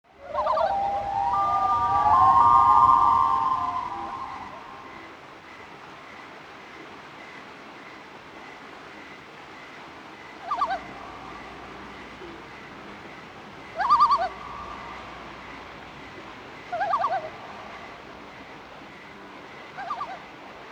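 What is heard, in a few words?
birdsong voices in the night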